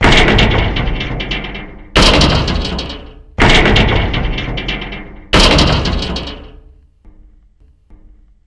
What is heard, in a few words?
Hospital Door Knocking